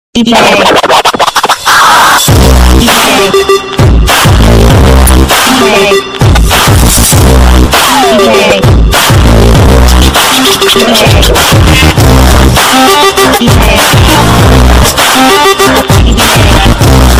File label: background dj bgm music; master movie; vijay sethupathi